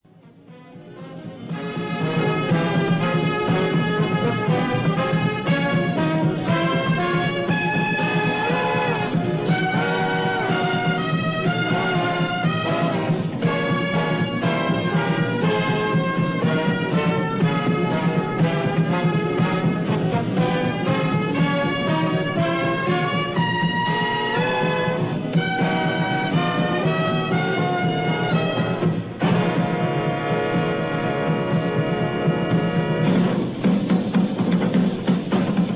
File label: melody